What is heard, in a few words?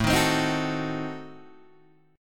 A Diminished 7th A down Guitar Open G